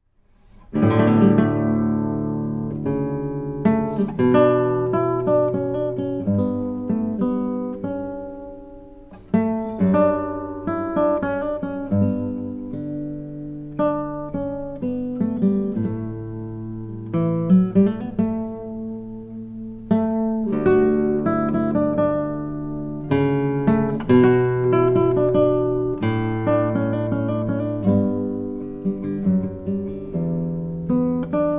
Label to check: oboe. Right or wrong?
Right